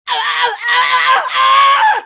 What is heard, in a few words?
minifig shocking death